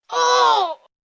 Pain Groan, Grunt, Impact, 1 child, strain, human voice, kid, groan, moan